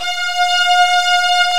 STRING ENSMB; Greytsounds VOL-1

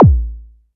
Zip kick; 300 Drum Machines

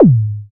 FX Analog; Sound & Vision - Gigapack II

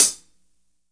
HH, Drum Dry; East Collexion; Drum 1 Dry

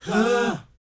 BOYZ, Vocal, R&B Dance; Spectrasonics Vocal Planet

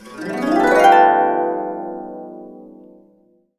B, Complete Harps; USB Soundscan vol.62; AKAI

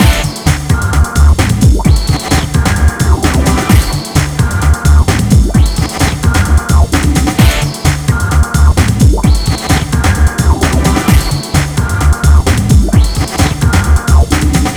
Hardcore Breakbeat, 130 Amin; Big Fish Audio - Hardcore Breakbeat Action; Hardcore Breakbeat Action CD1

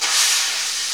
SNR XBRUSH, Snr Brushes; Brush&Rolls

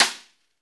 SNR, Snare; Club-50 - Foundations Roland; Ext.Snare 4

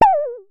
Synare Filter; Star Instruments Synare 3

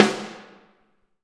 SD Real, Roland; Sound & Vision - Gigapack I

